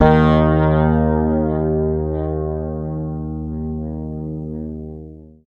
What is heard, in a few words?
D#, Bass; Sampleheads; Will Lee Bass Library